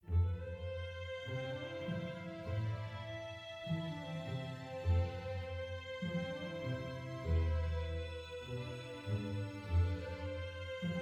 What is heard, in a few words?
adventure soft